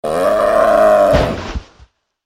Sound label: dikobraz middle die